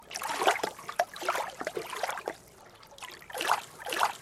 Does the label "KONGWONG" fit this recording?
No